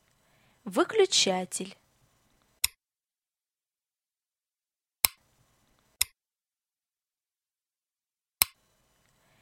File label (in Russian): Выключатель